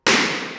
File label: Hallway; CFA